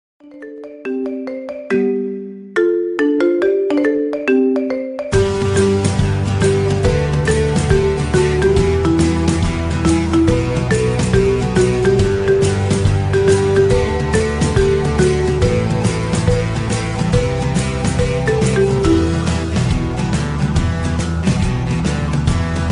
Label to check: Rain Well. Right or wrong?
Wrong